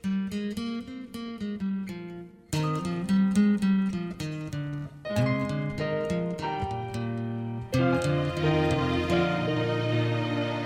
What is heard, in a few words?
High Key